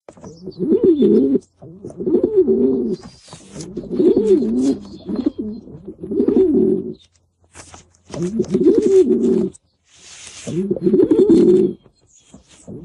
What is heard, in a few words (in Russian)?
Zvuki prirody Vorkovanie golubey